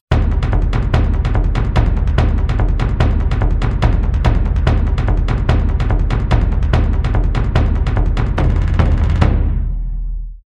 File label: Fatality Drumroll; Mortal Kombat 4; arcade 1997